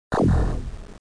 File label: build done; NETSTORM